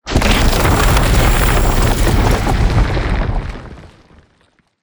Glacier

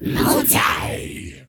FIRE MAGMA

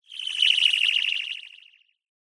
Rockslide fall

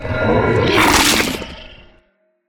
Bite

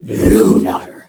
GHOST WOLF

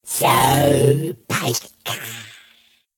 NORMAL ADDER

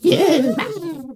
electric firefly

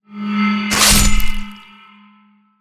Claw

